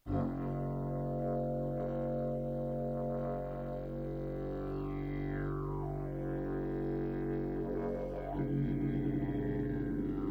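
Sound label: pvc F Sharp, didge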